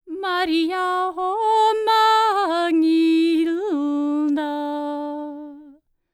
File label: Nord, World Voices; ILIO; Vocal Planet VOL-5